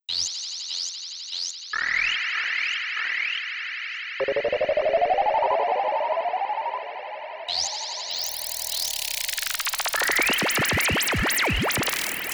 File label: pro-one dub